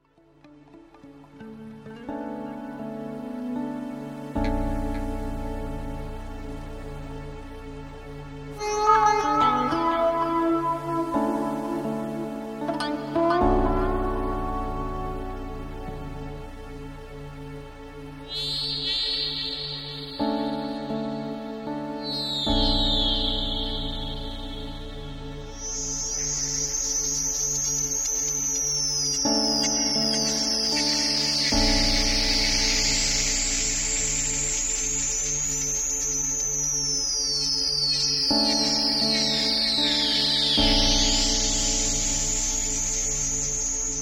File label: crickets; vibesware gr1